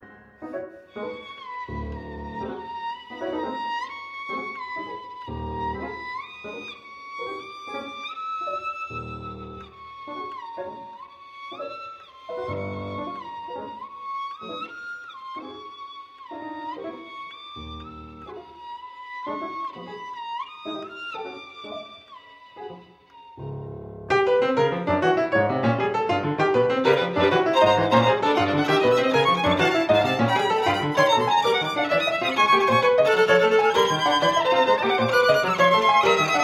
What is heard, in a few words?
Sonata for Violin and Piano